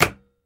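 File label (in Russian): короткий глухой звук пластмассовой защелки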